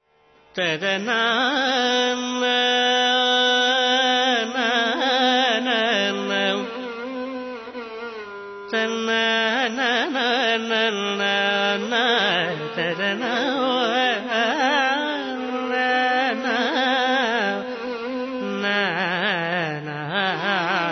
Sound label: Tamil Classic; RKC Live Stream; Indian Artists